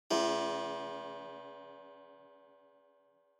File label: Pendulum Clock Chime Short